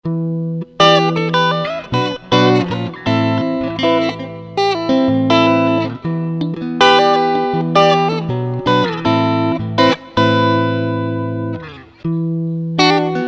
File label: Smooth Guitars